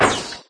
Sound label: fire big, paintgun; There; ThereClient